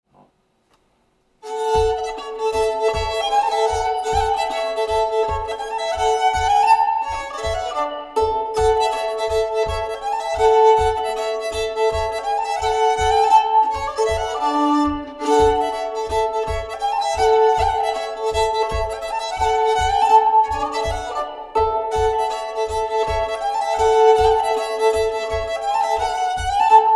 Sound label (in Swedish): polska